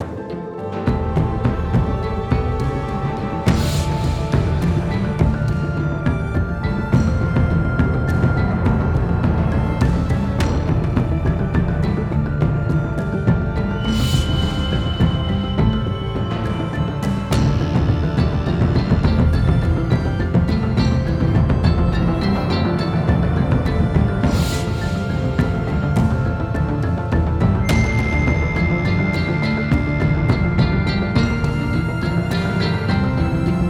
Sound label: loop Action; the walking dead michonne 3 what we deserve